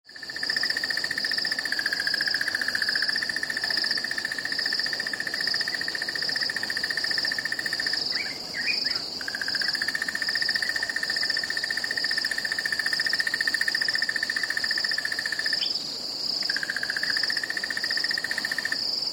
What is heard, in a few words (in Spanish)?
ambiente pájaros insectos